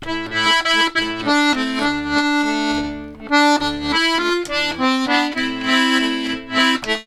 Complete Accordions; USB Soundscan vol.40; AKAI

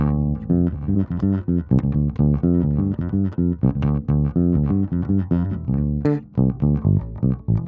Bass, 125bpm Dm, Nu Disco; Zero-G; Construction Kits; Speedy

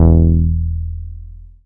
BAS MKS-50; Best Service Gigapack 2

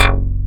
Bass, SYN; Best Service Gigapack 2

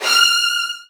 Virtuoso Strings, F3; ILIO; Kirk Hunter Virtuoso Strings VOL-1

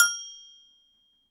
Agogo Bell High Hit, Latin Percussion; Sonic Foundry (Sony Creative Software); Joe Vitale Latin Percussion

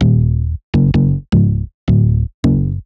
Punch Bass

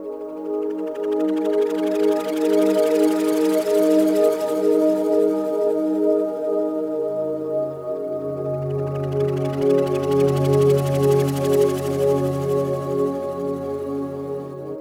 Iceplanet, Pads; Sonic Foundry (Sony Creative Software); Synchro Funk

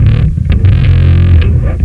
Distorted, Bass; Sonic Foundry (Sony Creative Software); Crimson Blue and Fabulous Horncraft 4 RnB; Loop Samples

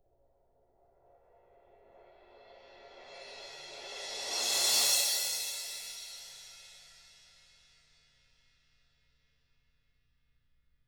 ZILDA SWELL

